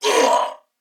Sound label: Pain Corpus Venus Male, en; Warframe; CorpusVenusMaleB